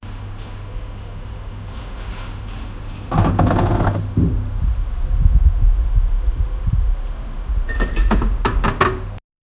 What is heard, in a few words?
coffee house sound effect; exterior